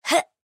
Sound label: Jump